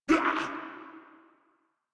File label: Pain, Grineer Male; Warframe